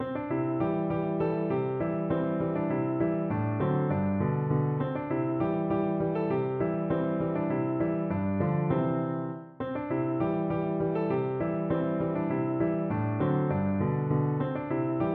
oh susanna, piano

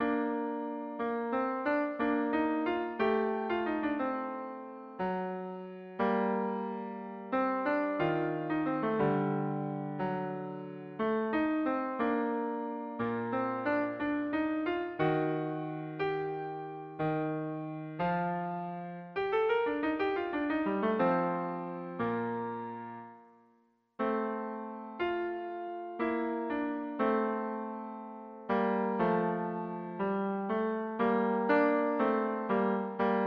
duos adagio, french horn duet

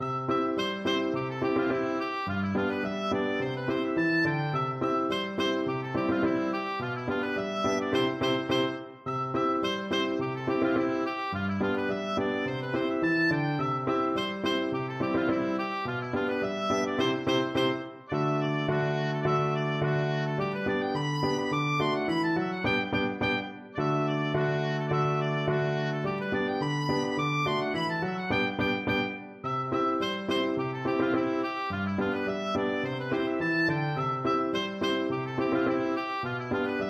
holiday schottisch, oboe

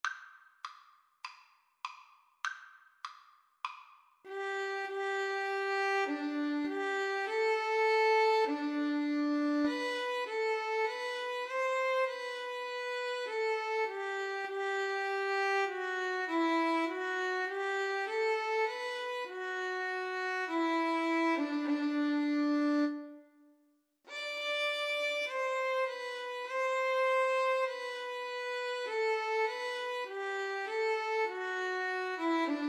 violin cello, Violin-Cello Duet

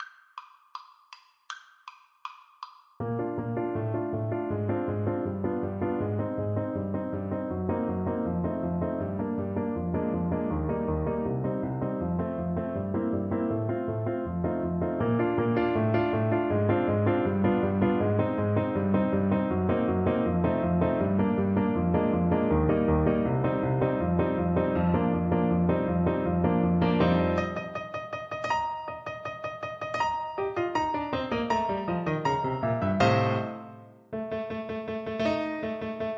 carnival finale, clarinet

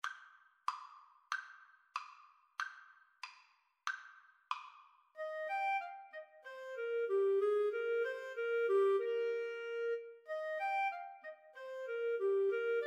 Clarinet Duet

